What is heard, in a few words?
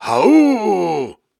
Grunts and Chants, Viking Vocal